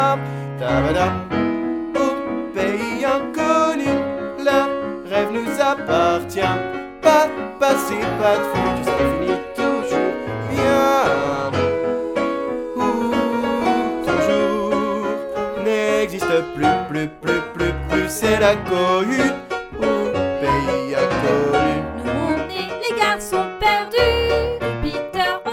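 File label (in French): Basse, choral bass part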